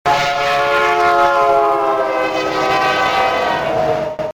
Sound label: Highway